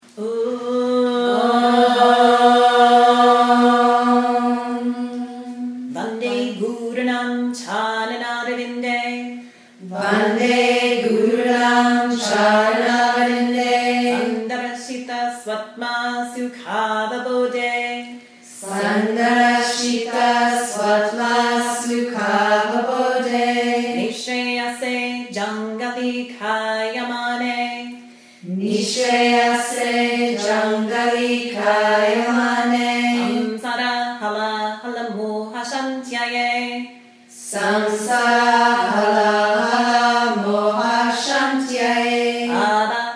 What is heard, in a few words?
Opening Mantra